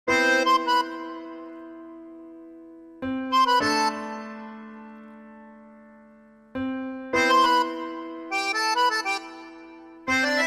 Tango Mood

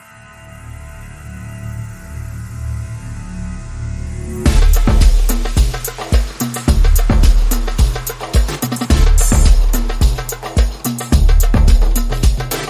Arabian House Underscore

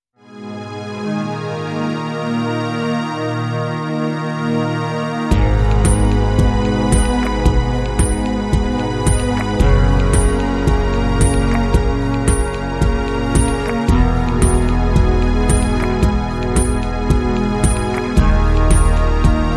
Electro Orchestra

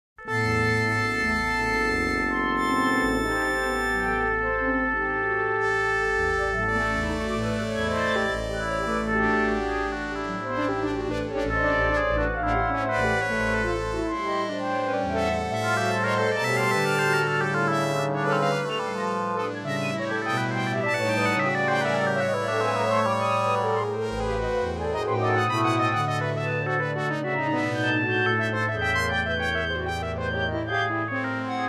Orchestral Warmup

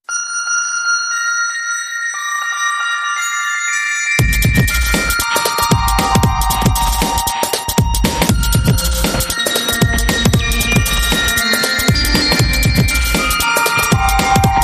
Beats

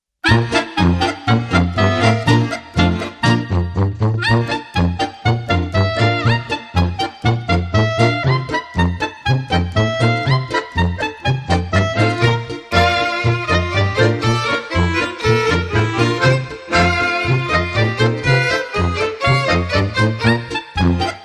The Clown Popov, No Drums

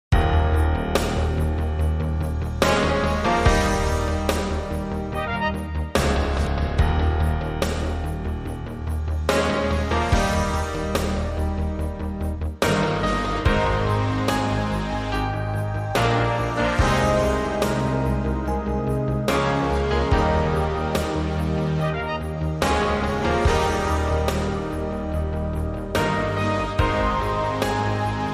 Synth

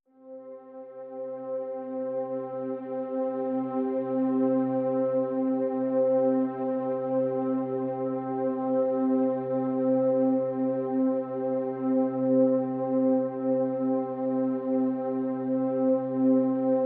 Pads Ethereal Mist (C)

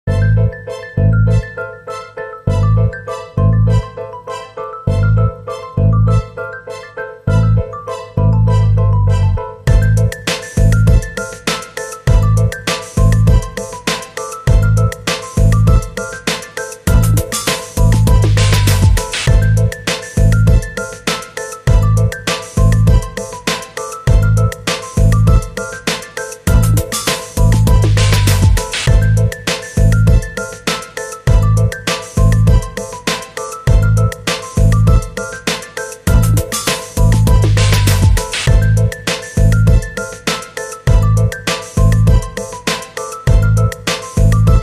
Coolbeat

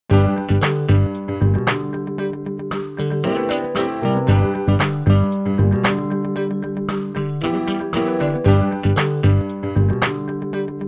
Without Music Box Theme

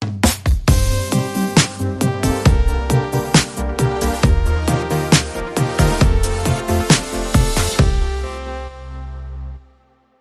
Link Sting

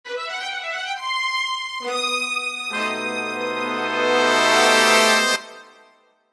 Tension Strings & Brass Build

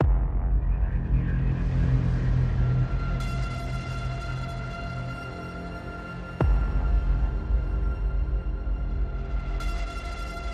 No Lead Synth

